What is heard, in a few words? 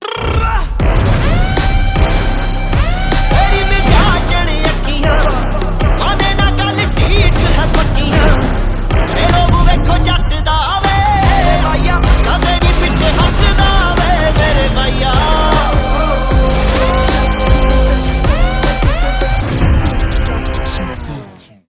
Song; Kalki